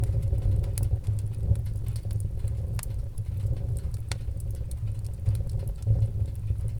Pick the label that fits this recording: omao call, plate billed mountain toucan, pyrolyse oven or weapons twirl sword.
pyrolyse oven